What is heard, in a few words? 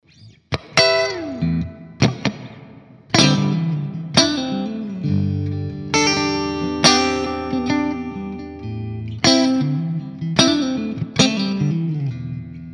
jazzy